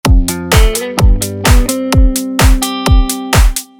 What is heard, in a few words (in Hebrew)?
האוס